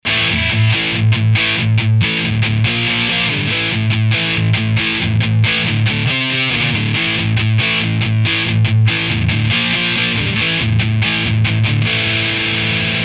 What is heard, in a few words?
AMP DIst